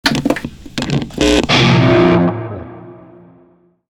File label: Shawzin Equip; Music; Warframe; Narmer Shawzin